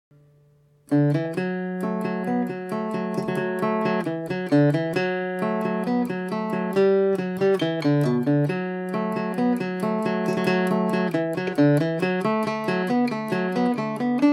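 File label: D major at 40, irish mandolin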